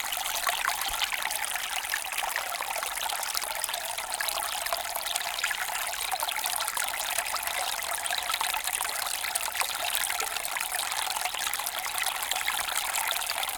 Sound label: water river small stream detailed close perspective; stereoXY; SoundHolder; Guber